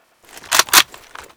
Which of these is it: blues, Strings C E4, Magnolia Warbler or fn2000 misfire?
fn2000 misfire